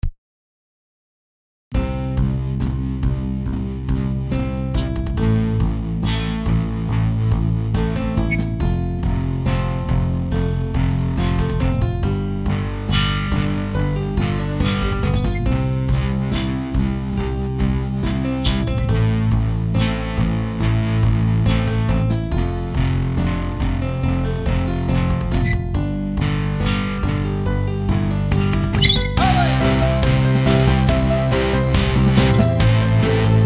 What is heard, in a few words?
guitar test